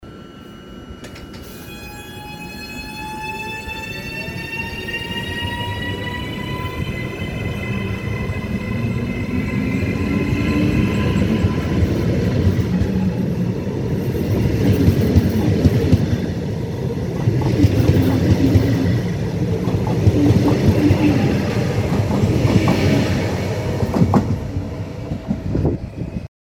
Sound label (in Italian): Partenza ETR 521; etr 521 rock